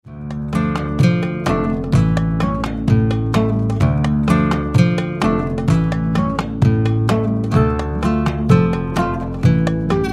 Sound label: capricho arabe, gitarre flamenco